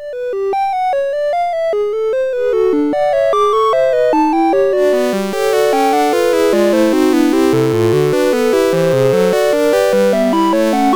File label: Gigue, MOS6581; Syl